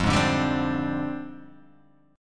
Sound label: F Minor 6th Add 9th, Guitar, Open G; down; chord shape